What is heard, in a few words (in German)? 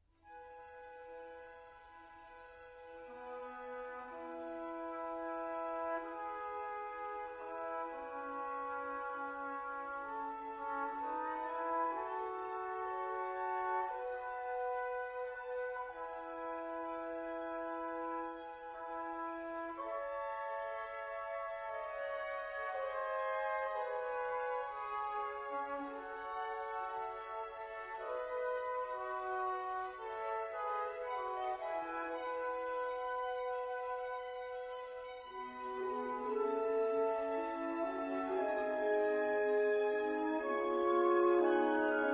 Rundfunkorchester - Symphonie Nr. 1 E-Dur; Hans Rott; I Alla breve